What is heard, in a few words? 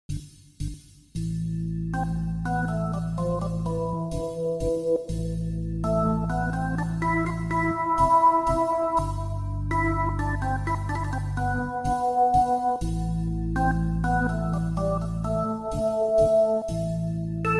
hammond b3 organ vst software; Syntheway Master Hammond B3 Organ; windows; audio unit